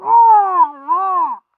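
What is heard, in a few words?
Pyro pain critical death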